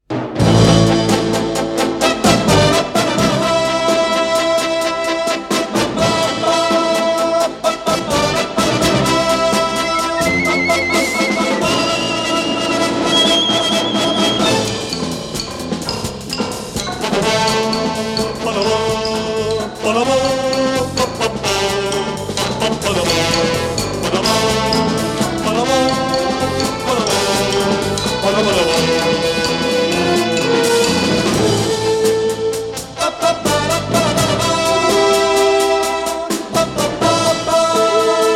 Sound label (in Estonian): Instrumentaal, Eesti Filmimuusika; Eesti Kullafond 1